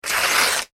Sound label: paper rip; Sheet Of Paper Ripping / Tearing; foley; Objects; McKinney Sound